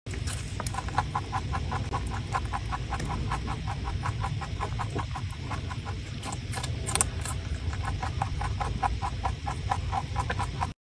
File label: Rabbit eating; صدای خرگوش